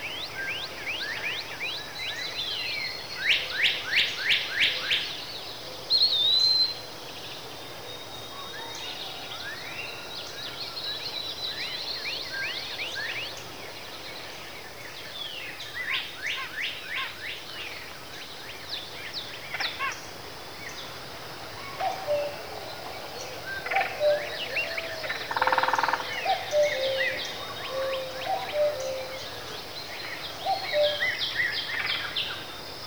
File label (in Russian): zvuki prirody zvuki lesa; cstrike